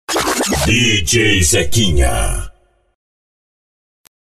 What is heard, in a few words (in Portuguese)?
VINHETAS; sets mixados